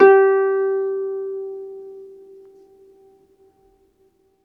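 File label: HARP A3 SUS; ILIO; Synclavier Strings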